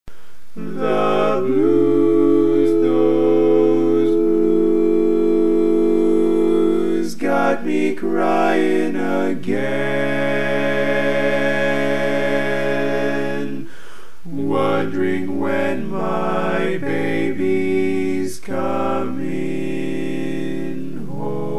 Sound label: All Parts, barbershop tags